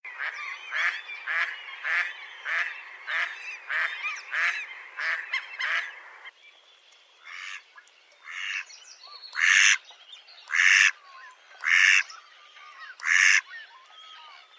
stokkand

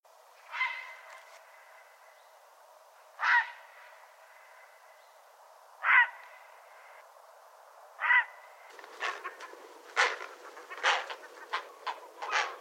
GRAAHEGRE

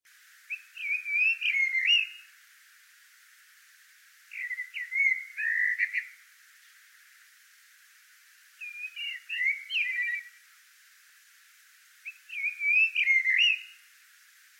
Svarttrost

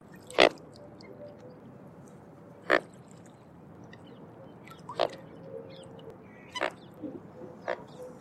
knoppsvane call

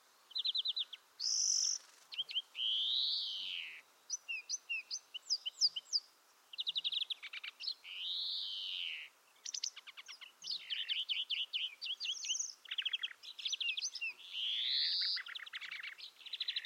GROENNFINK

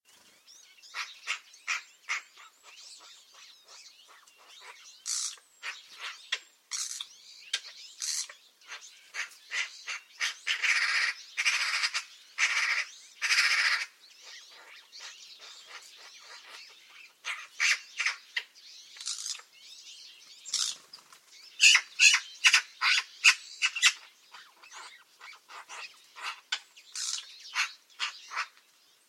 Skjre lyd

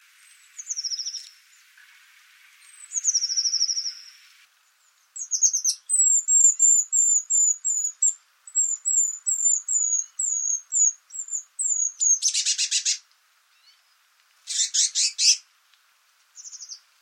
BLAAMEIS SANG CALL

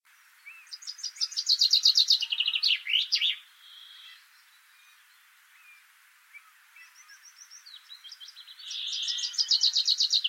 Bokfink lyd

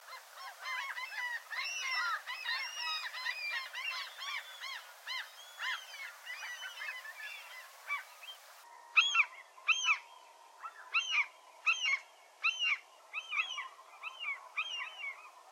FISKEMAAKE

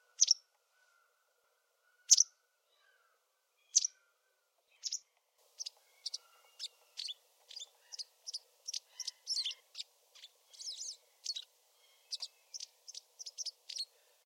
LINERLE

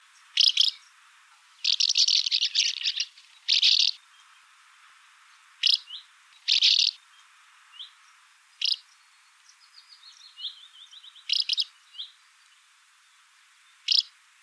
taksvale gransanger

